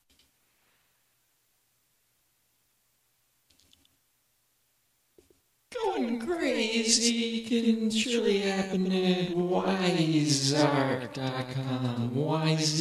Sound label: reggae techno trip concert